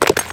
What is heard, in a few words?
short brick break